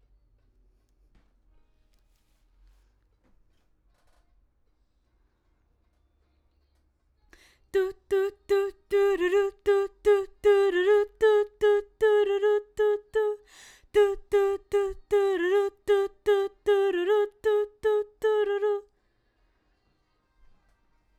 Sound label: BG Vox